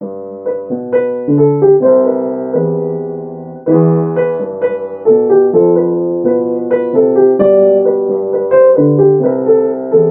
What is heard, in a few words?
small piano